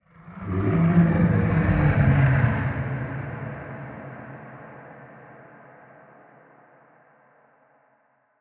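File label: ambience unknown creatures kaiju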